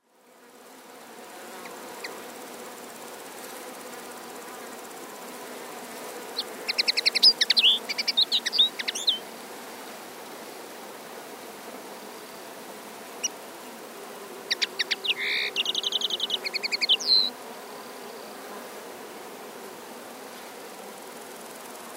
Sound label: linnet carduelis cannabina